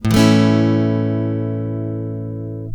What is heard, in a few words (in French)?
accord la majeur, l’accord de La Majeur en vitesse normale; guitare